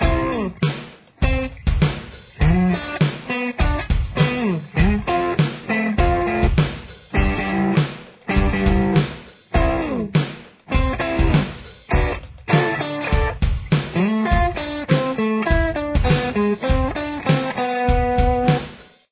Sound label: chorus guitar